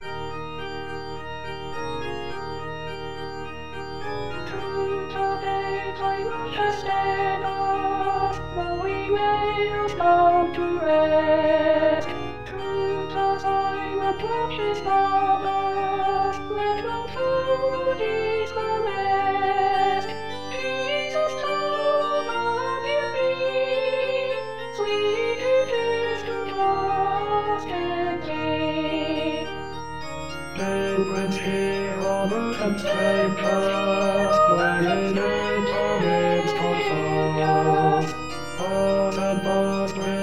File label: Through the day All